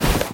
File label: move soft; scripted sequences, a1, safehouse